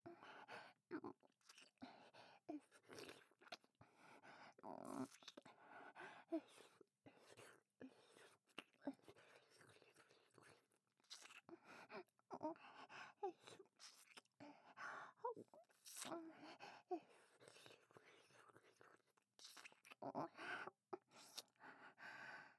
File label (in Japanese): 喘ぎ混じりのDキス